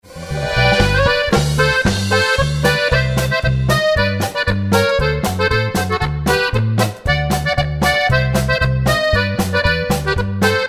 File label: Happy Minute Polka